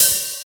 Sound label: open hat sound c sharp key